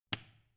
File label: switch turn off hard